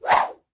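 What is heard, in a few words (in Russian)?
богатырской силы удар старинным орудием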